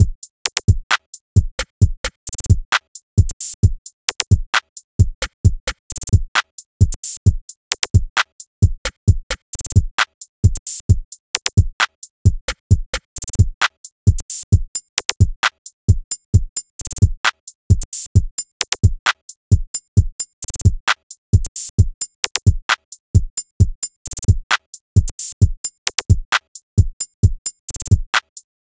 drums

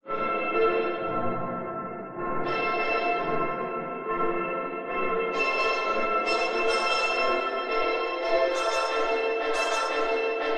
mel and pad at end

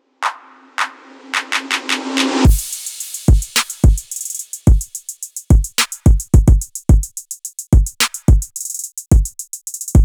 drums

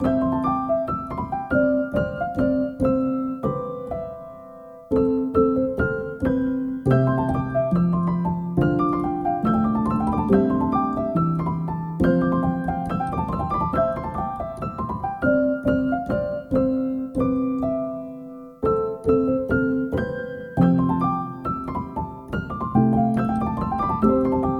piano

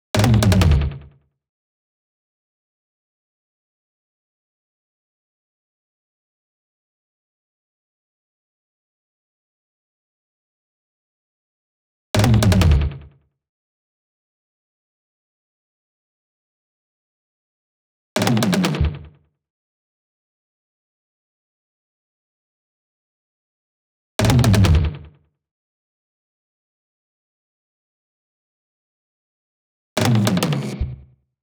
drums tom

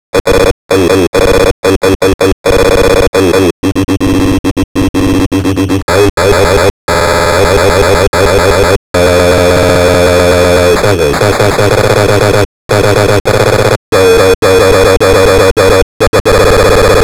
voc

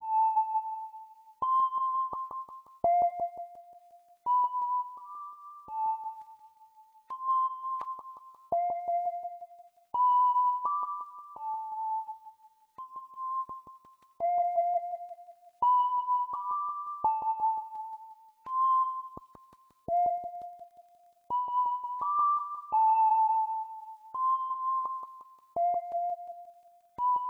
backsynth

